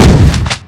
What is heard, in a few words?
gun cock; Freeciv